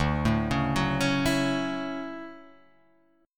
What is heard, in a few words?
D-Major 9th D down